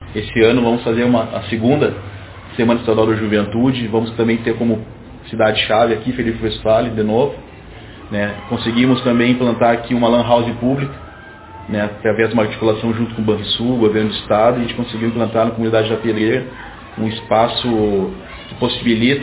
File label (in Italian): Bassi